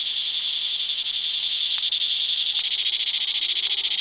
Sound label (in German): gesang